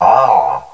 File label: aah; caged